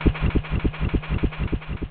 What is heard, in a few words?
Bats; Curse of Dragor